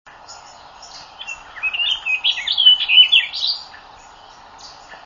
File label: oriole orchard; birdsongs